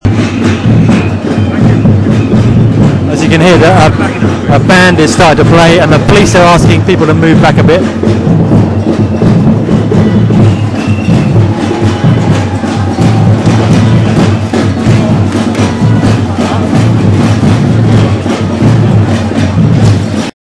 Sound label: band